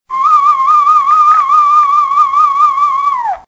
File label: growler